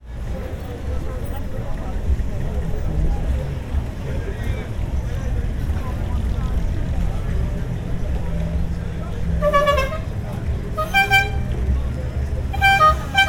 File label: Ambiance And Minivan Horn